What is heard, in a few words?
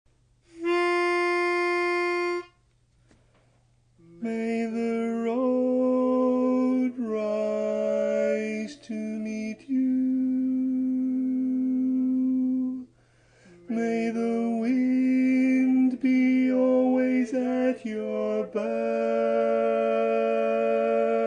Irish Blessing Bari